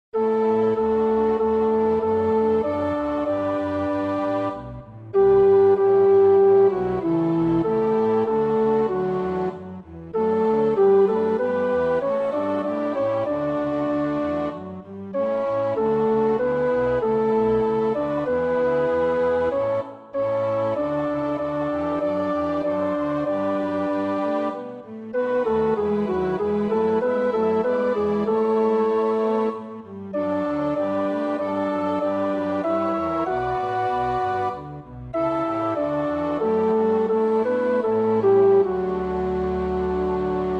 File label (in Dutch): tenor, koorpartij oefening; Bach (Johann Sebastiaan); BWV 064